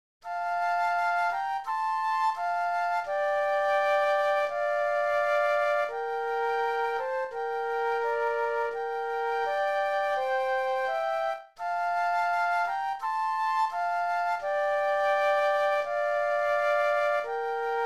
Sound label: Flute Duet; christmas